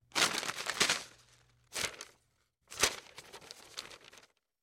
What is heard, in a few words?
Parchment Paper Hand